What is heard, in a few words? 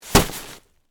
Horror, Body, Fall, On, Plastic, Tarp